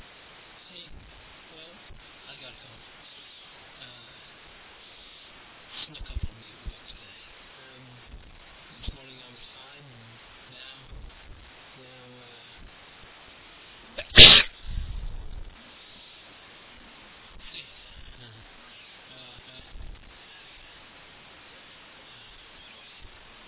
cold, male

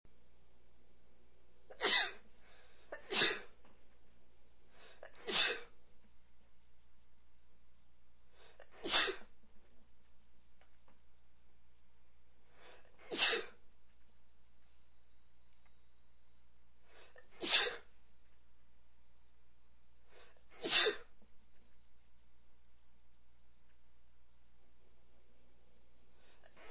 lots and lots, male, sneezes

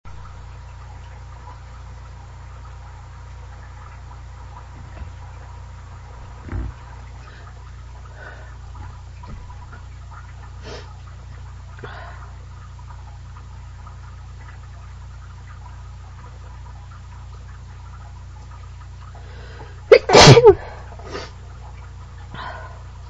much anticipation, female

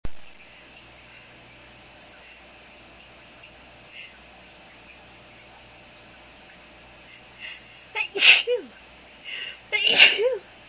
allergies, female

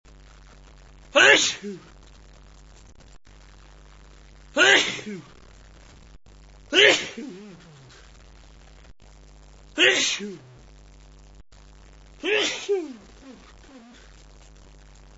snuff sneezes, male

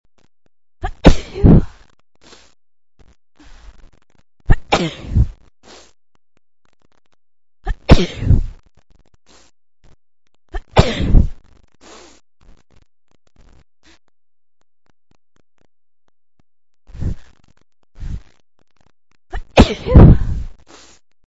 big, female

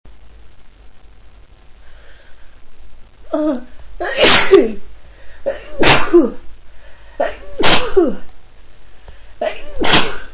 spray for miles, female

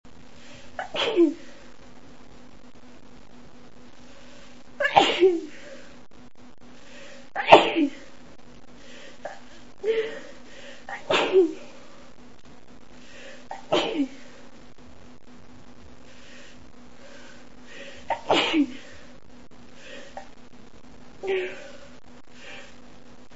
morning sneeziness, female

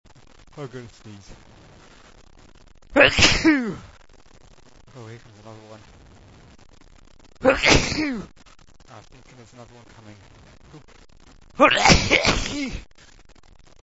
gonna sneeze, male

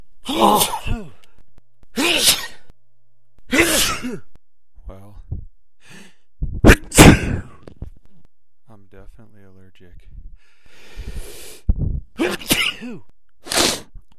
cat, male

